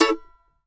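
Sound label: mandolin A major, Country; Sampleheads; Whole Lotta Country 1 & 2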